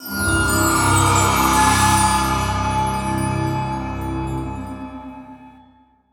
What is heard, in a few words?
Music Logo Sting; Menu FX